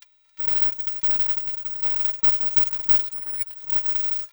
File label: vocals